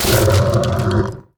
warior; kult

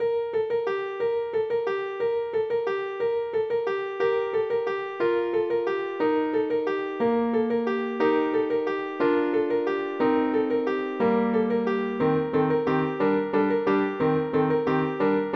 BELLS

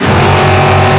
CAR; NFS3 Cars; dart

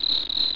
crickets; animais

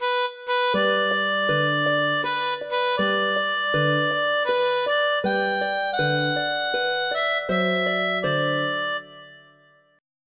LULLABY; Wired for Sound Pro CD; Aristosoft, Inc.; music

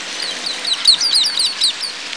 gulls; moviesetter; charley cat corral quarrel

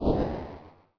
rush; Despair 1.6

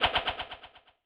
Echo Swish; AMOS Music

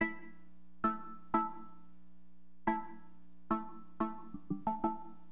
BLIPS, Keyboards; HipHop; LiveAct Pool 1

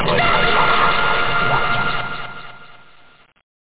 SCREAM; DRAGON20

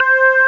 hammond

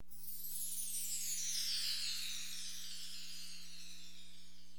CHIMES, Perc; LiveAct Pool; AcidJazz Funk

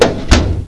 Close; Happy Easter 1.0b1

